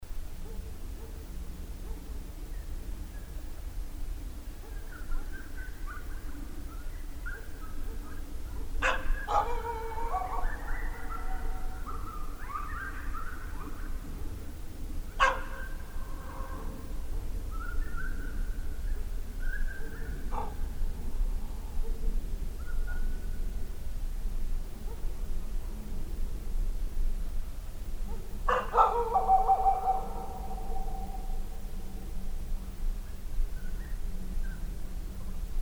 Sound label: Illinois Howl unfiltered long version